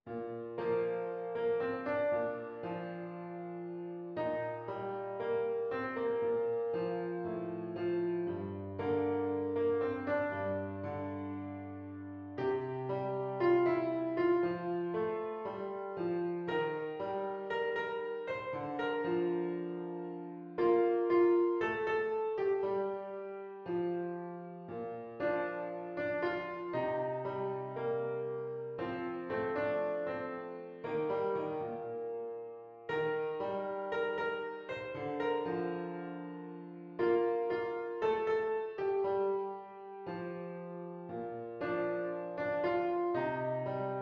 Klaviersatz einfach; Cramer

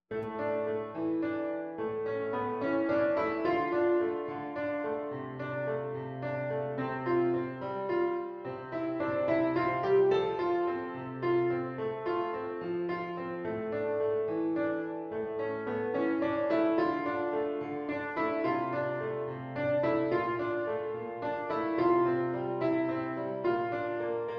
Klaviersatz; Bin zu allem bereit

